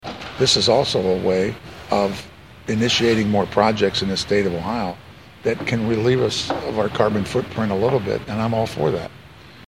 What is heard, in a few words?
Wind Turbine